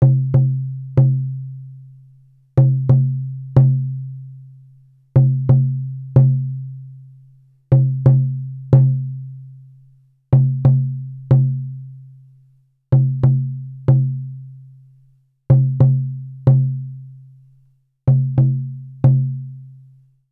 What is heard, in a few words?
Big drum; Stars (Tachenump)